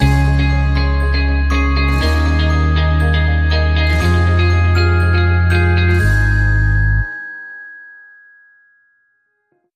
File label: No Drums Sting